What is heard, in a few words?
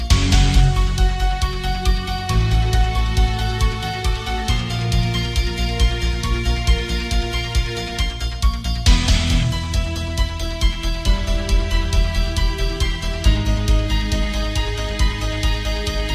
halloween metal